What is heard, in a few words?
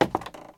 wood; firefight